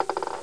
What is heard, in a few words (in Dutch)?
Tokketokketok